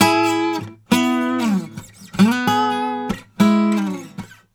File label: Blues Guitar lick; ILIO; Fretworks; 96 HIRUM LICK